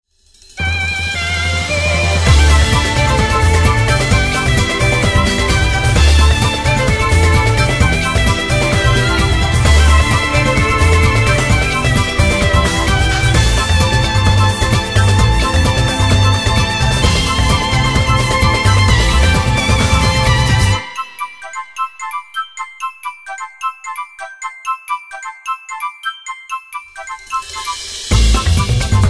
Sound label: vocaloid mix karaoke; pangya